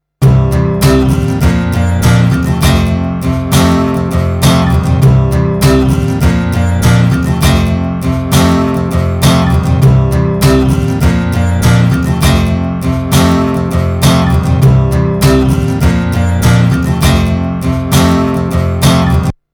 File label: Acoustic Guitar Process Hi smooth